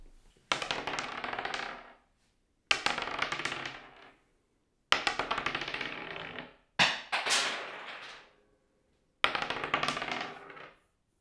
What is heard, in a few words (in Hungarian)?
a harmadik kocka leesik, dobókocka; Perkupa